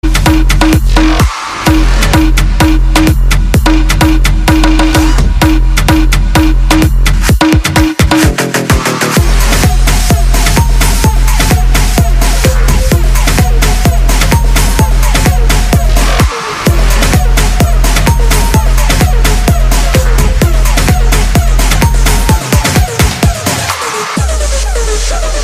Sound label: hard electro